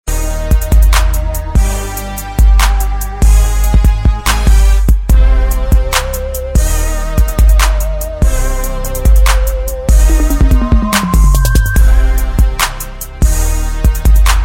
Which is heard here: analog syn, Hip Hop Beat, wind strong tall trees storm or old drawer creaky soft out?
Hip Hop Beat